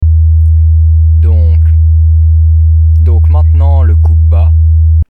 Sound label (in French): sans coupe bas